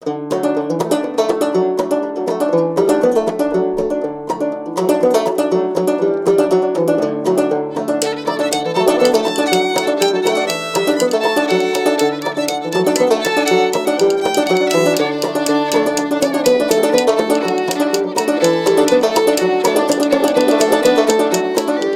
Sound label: banjo